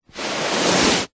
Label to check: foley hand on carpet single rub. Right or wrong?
Right